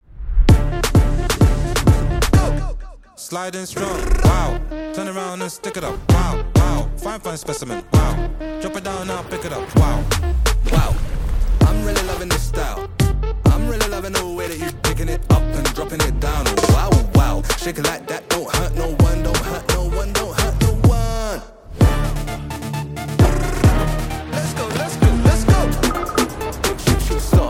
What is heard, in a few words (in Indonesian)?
Nada Dering Intro iPhone 15